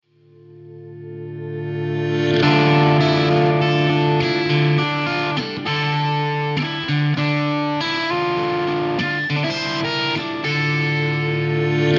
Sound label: Dm 100 GTR Rocky Riff